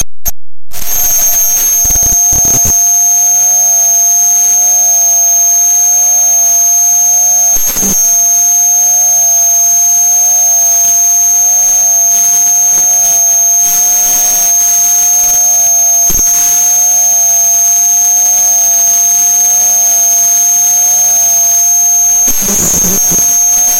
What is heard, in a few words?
ultrasound hello